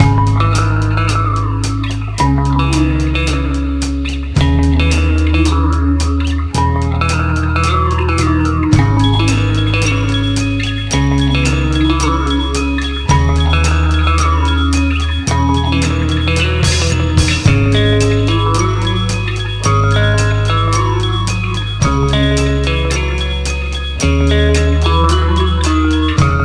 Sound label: Bike Chase Mid; bully scholarship edition